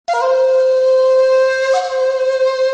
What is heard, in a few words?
flash sound notification